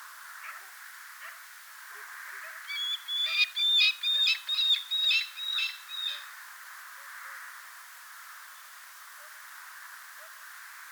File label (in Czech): sokol stěhovavý Falco peregrinus